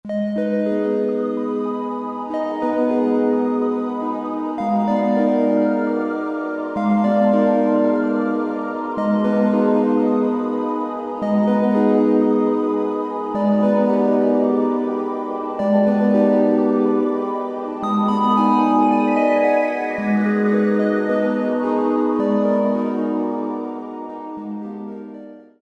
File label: Space Echo, Vintage Synth; Roland SR-JV80-04